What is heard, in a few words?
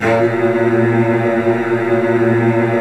A#, Virtuoso Strings, STR HLF TRLL; ILIO; Kirk Hunter Virtuoso Strings VOL-3; 90 s Sample CDs